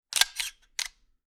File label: Part Assembly; TOOLKIT vol.2